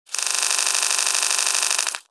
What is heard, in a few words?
name reveal flips